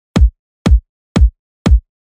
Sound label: house, EDM